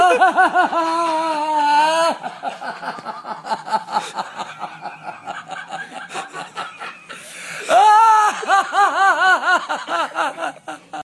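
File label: Smeh, laugh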